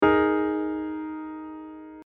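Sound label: Dsus4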